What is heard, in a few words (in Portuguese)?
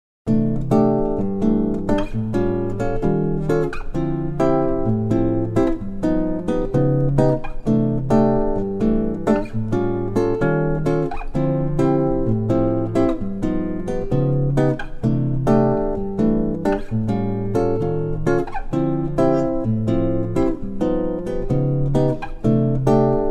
Bossa Nova Turnaround variacao